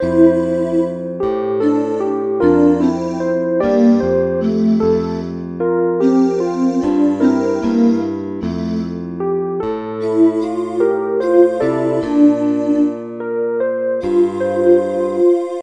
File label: dog Music